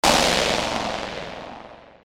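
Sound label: percussion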